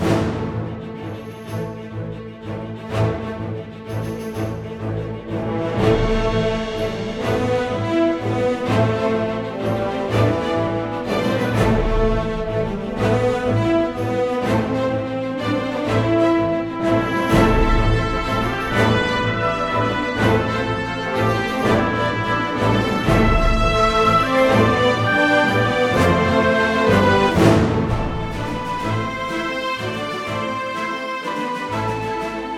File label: Music Battle Medieval; rabbids multiverse; iOS, macOS, Mobile